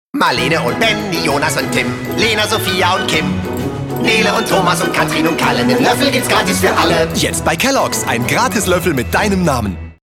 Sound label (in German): werbung gesang; kellogs